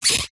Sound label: cartoon squeak